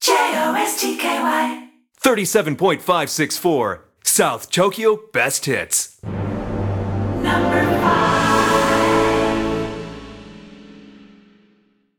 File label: Radio Jingle; let it die